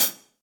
Hihat Closed Shank Room With A Vista; Drum Hits; Standard Hits; Ableton; Factory Packs; Session Drums Studio

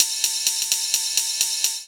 Jazz Tops 128 bpm; MIDI Clips; Ableton; Factory Packs; Skitter and Step; Jazz Lyfe

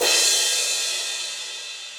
Crash 18 Deep Snare Dynamics, Cymbal; Drums; Drum Hits; Standard Hits; Ableton; Factory Packs; Session Drums Club

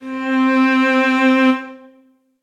Long All-in-one String Quartet; Instruments; String Quartet by Spitfire Audio

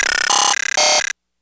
glitch stuttering; kidGlitch; Ableton Foundation FreePacks; Wicked Kits

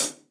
Hihat Multirod Closed Shank Dry Acoustic; Drum Hits; Standard Hits; Ableton; Factory Packs; Session Drums Studio

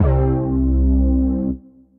Bass; Syd Bass

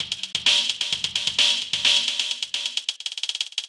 Dubstep 70bpm, MIDI Drum; Ableton; Factory Packs

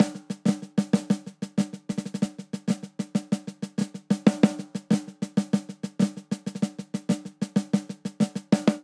Simple Seven Snare Only 7/8 190 bpm; Ableton; Factory Packs; Drum Booth

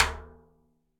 Surdo Rim; Latin Percussion; Drums; Drum Hits; Ableton; Factory Packs

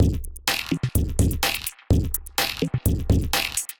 Kit groove 126 bpm; MIDI Clips; 122 - 130 bpm; Snake Paw Kit; Glitch and Wash; Ableton

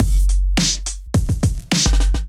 Gate Groove 105BPM, Drums; MIDI Clips; Allee; Ableton; Factory Packs; Chop and Swing

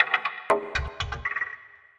Digital Dance Zones, Playful Percussion; Ableton; Mood Reel

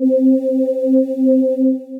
I Hear Voices, Synth Pad; Instruments; Ableton; Synth Essentials; Operator

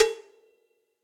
Cowbells BrushSizzle; Drum Hits; Ableton; Factory Packs; Session Drums Club; MPE Hits

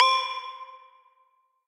A Squeaky Bell; Bells & Mallets; Ableton; Factory Packs; Beat Tools

